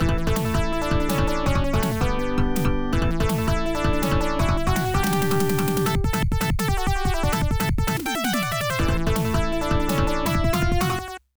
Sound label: Power-Up; magical hat no buttobi turbo daibouken; genesis; Hi-Potion